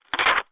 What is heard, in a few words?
household telephone hang up in ear peice